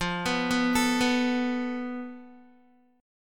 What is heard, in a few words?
F Major Flat 5th, Guitar; FMb5 arpeggiated; JGuitar